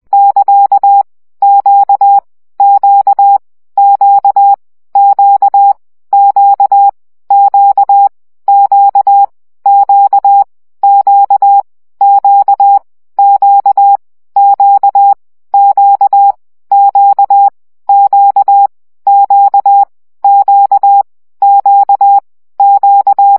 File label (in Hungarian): CW gyakorlas q 17 baud